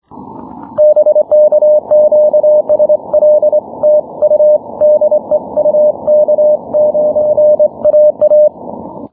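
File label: 6m continuous wave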